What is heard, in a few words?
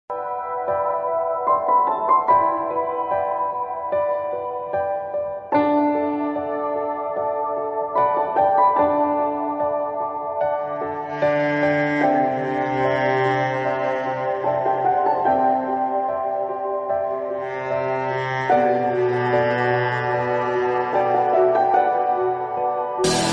pakistani ost instrumental violin bgm theme; parizaad